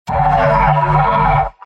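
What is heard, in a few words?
pyro m pain critical death; tf2; garrysmod; mvm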